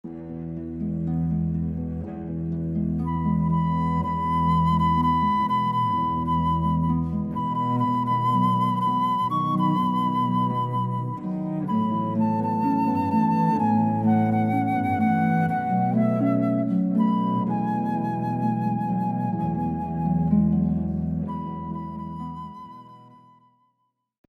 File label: Wedding Song, harp flute cello